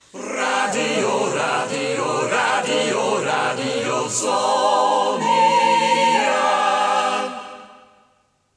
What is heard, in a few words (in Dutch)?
A Capella draaiorgel, jingles radio; Radio Zoniën